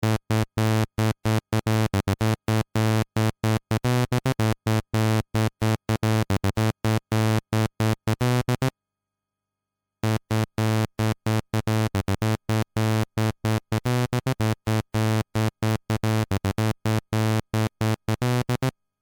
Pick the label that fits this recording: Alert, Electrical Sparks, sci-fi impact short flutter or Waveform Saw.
Waveform Saw